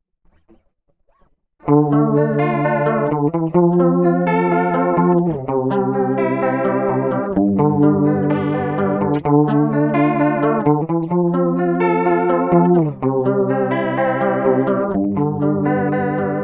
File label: Chorus